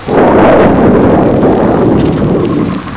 WIND

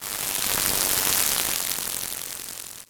FRYING; BEAT2000

